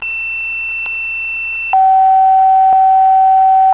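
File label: Tones, Fire Company; WITMER 4-10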